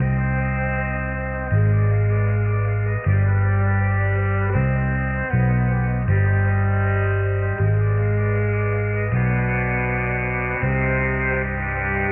strings somber; Loops; Sad

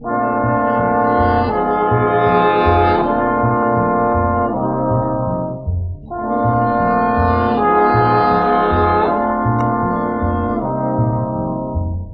horns; Loops

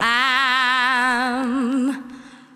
"ahm", Voice

